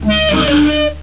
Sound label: drown